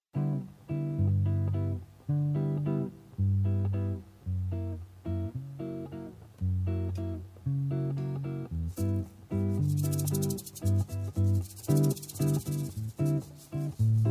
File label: Jazz Band